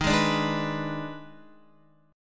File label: Eb Major 7th Suspended 4th Sharp 5th, Guitar, EbM7sus4#5 chord; strummed; x,6,6,7,8,6